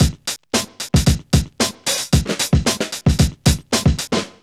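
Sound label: 113 bpm, Funky loops; Zero-G; Funky Elements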